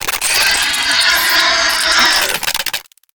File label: Spider Trap Activate; Evolve; Gorgon